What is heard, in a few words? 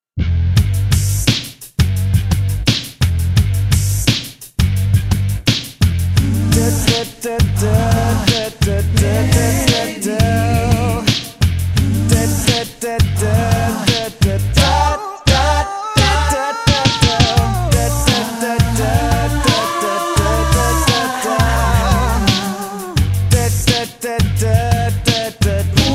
Ending music; Chiptune; Playstation 2; Crash Twinsanity OST